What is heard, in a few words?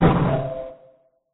cry